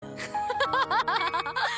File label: laugh